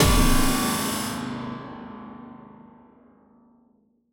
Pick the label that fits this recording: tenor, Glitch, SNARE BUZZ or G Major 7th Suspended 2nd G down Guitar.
Glitch